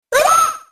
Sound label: attack; snes; smas; chibimoon